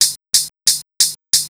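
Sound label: drums, Pure Electro; USB Soundscan vol.03; AKAI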